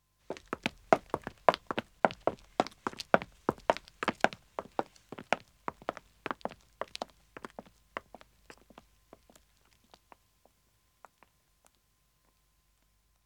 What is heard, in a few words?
Pavement Walking 2 men depart, Footsteps; BBC Sound Effects Library